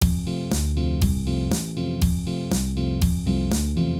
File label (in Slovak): Polka ukazka hry polky na basgitaru